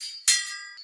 sound ringer normal

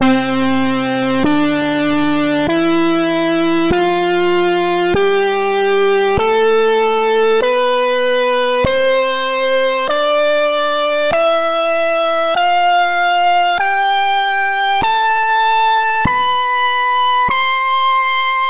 scales c

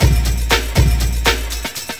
dirty loop

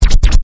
DJ Scratch

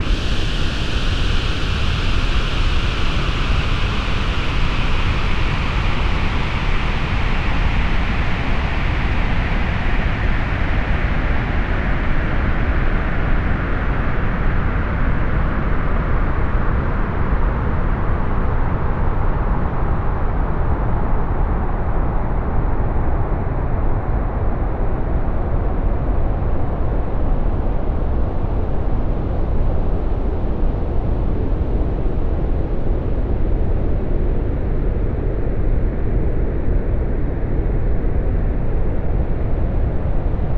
simple noise

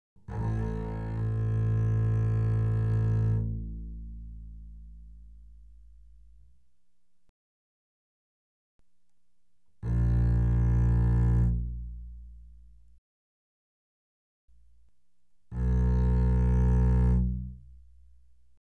Bass arco ff A1; sulA

